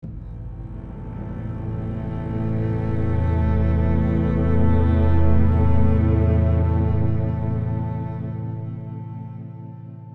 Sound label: Tense (Stinger); The Walking Dead: The Telltale Series